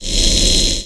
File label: Drill Bit; Playable Tutorial Level; TR3